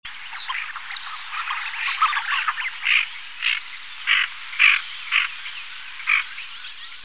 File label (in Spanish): porron monudo